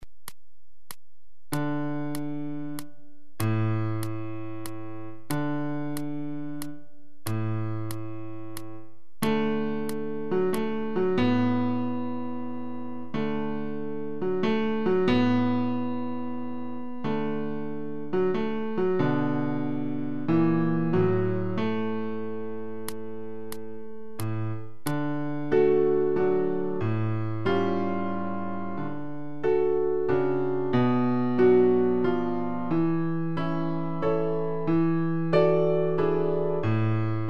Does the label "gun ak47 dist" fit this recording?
No